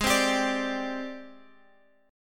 Ab Major 7th Flat 5th Ab, down, Guitar, Open F; AbM7b5 strummed

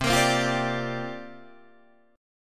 C 9th Suspended 4th C down Guitar Open D

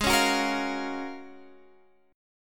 Ab 13th Suspended 4th Ab; strummed; JGuitar

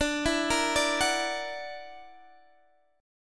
F sharp Augmented 7th D, chord

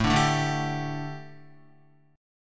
A 7th Suspended 4th, A, down, Guitar; A7sus4; strummed; JGuitar

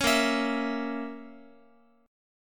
A sharp Diminished A sharp, Guitar; strummed; 4A,4E,5A,5D,5G#,6C#; JGuitar

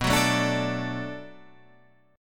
D Major B, guitar; D/B strummed

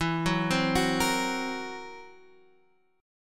F sharp 7th Flat 5th E

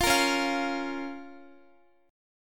Db 6th, Guitar; strummed; x,x,15,15,13,12

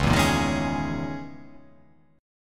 Db Minor 6th Add 9th Db down; Dbm6add9 strummed; JGuitar

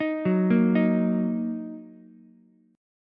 D sharp Minor D sharp down Ukulele; arpeggiated; JGuitar

